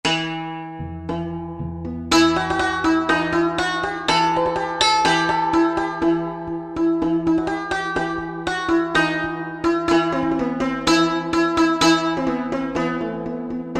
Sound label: Dusty Room; Roland SR-JV80-14 World Collection Asia